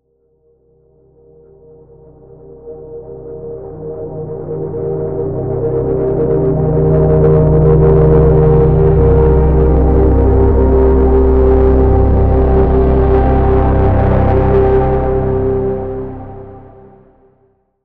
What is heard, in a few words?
Riser